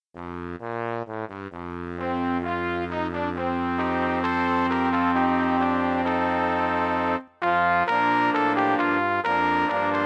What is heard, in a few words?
Palm Sunday Fanfare